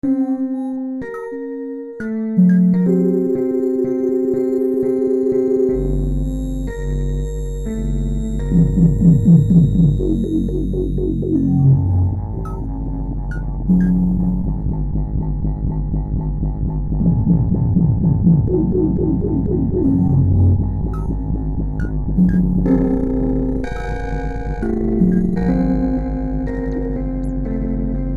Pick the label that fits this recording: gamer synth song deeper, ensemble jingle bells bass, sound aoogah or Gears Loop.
gamer synth song deeper